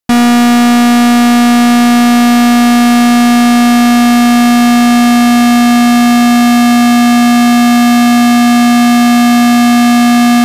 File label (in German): Acer Monitor Helligkeit 70P